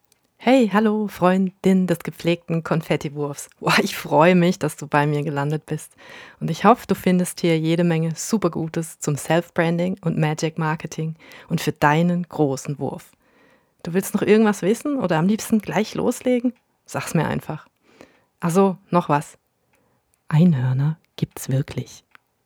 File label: walzer